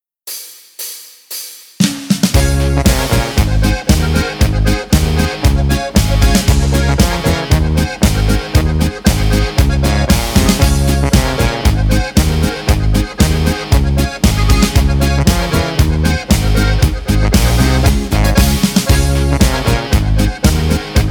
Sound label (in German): Boarischer